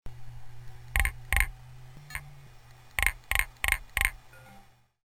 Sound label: click